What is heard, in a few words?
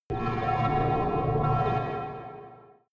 space machines working hall